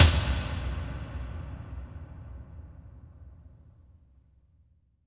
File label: toms, cinematic impact, drum